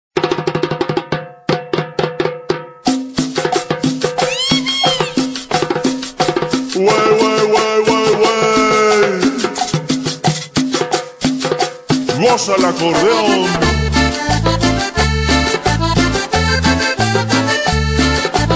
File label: cumbia